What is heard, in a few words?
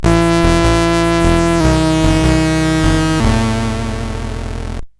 sid 8580